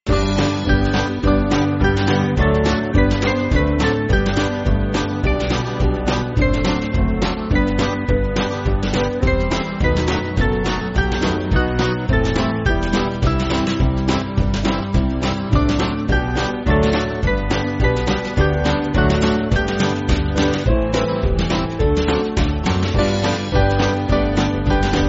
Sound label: Band, church music